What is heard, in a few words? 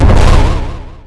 explode